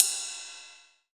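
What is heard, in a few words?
CYM RIDE, ride cymbal; Sound & Vision - Gigapack I; mono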